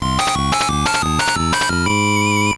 Unused Song; Mogura de Pon

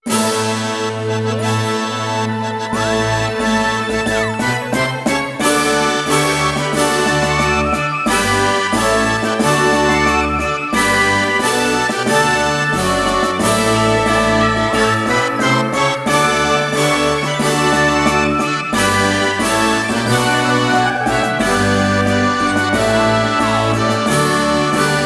Ceremony; Club Penguin Game Day

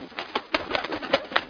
BIRD WING FRENIZED; Frogger 1997

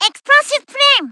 Explosive Flame; Smash 3DS Kirby

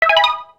RED COIN; YWW

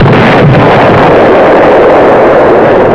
BOOM OLD

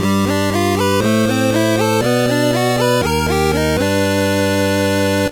Unused Song; Mogura de Pon

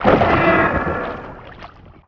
ugly fish Die Soft

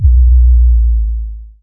bass

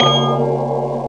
bell

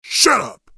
Human Thief Attack Special; World of Warcraft

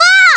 Damage Fly; SSBBrawl; Popo

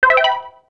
RED COIN; YWW

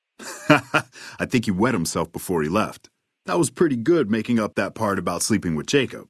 laughter; Van Graff

